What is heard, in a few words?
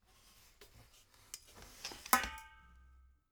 folding chair unfold slow; objekte; stuhl